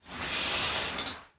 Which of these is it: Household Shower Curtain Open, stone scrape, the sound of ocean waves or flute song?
Household Shower Curtain Open